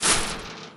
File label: chainlink, footsteps; player